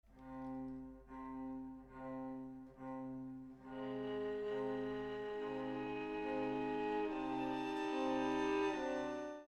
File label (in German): Klassik